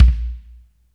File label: BD SOFT; drum; BASSDRUMS